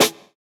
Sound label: Snare, jungle breaks drum sounds